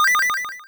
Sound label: MOVE; C64 Dreams; Multi-Sound Default